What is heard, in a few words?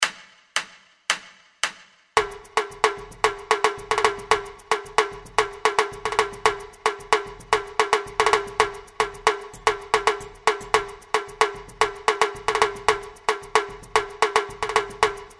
Levada Repique, samba